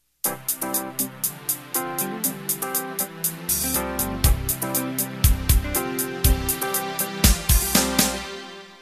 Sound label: Fusion Intro